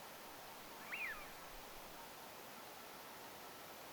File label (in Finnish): haapanan soidinääni